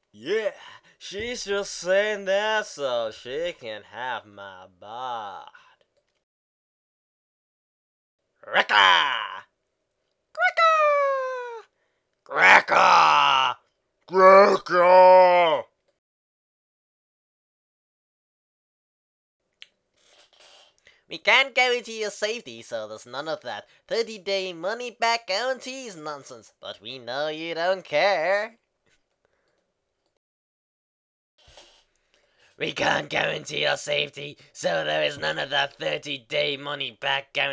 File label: lalal voice